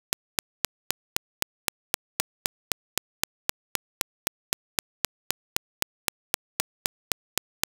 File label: clicks